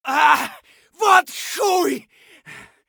hurt light; Escape from Tarkov

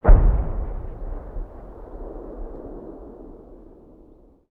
Amb Destruction; Red Orchestra Ostfront 41-45

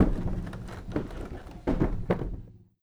door large wood close end; Rust

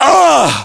pain; ModernWarfare; localized english

